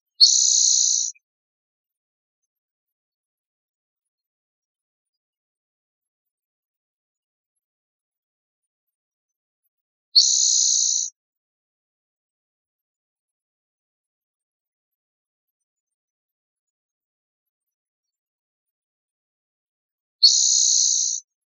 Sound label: SPTO buzz; A brighter buzz given on territory. Example from northern Oregon